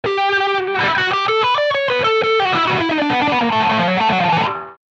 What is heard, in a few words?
FuzzWah